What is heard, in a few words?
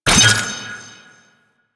Shrine Assemble Frag Collected; Warframe; Duviri